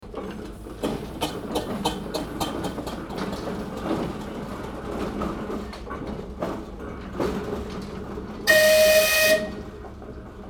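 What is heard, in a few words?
Steamrollers, field recordings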